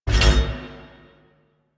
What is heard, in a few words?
Bash Cast; Warframe; Warframes; Hoplite